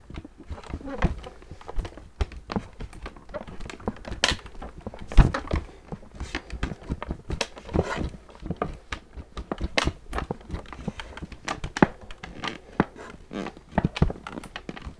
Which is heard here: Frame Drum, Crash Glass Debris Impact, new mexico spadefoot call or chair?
chair